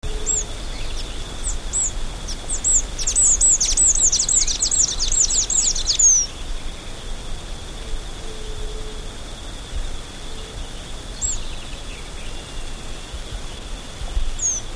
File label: Tangara cayana